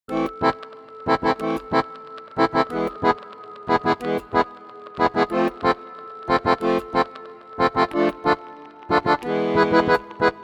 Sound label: accordions; best service accordions 2